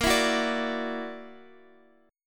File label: Gb Augmented 7th Bb